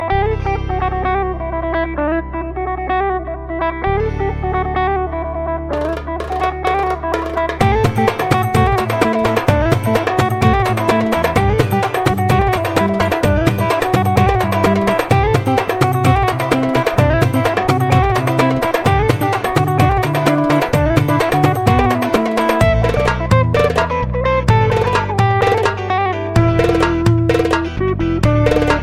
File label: Egyptian Music; 7up